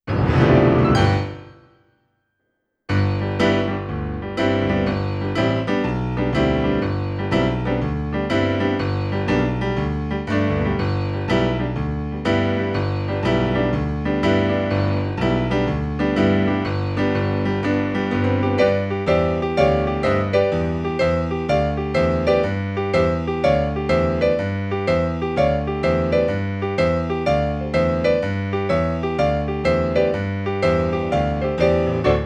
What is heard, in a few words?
accompaniment